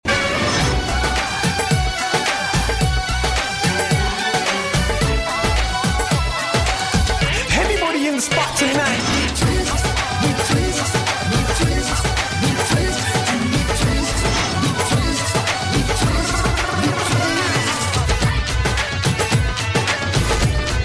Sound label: Bollywood Ringtone